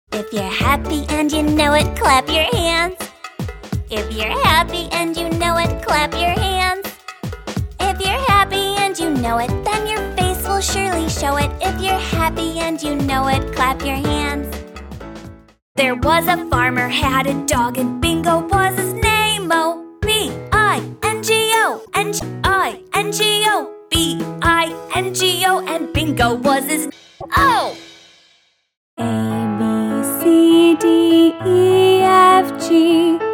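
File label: American, Songs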